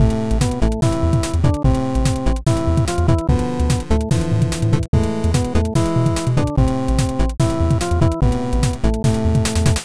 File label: bgm